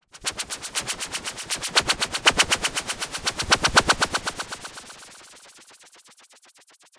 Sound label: snare 8Hz sine